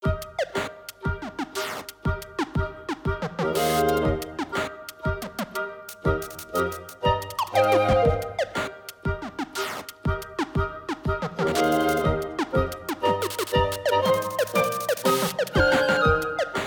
A Quick Break; pushmo world

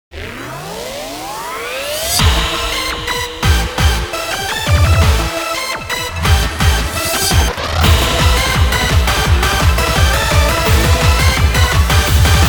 Jingle Medley; Digimon World Next Order Original Game Soundtrack

